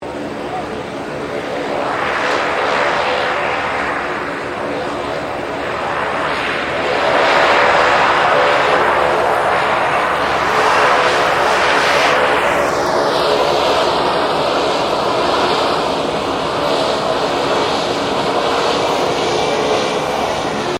AIRCRAFT C-27J SPARTAN TAXI, Spartan Transport aircraft; Airplane; Airplanes; Vehicles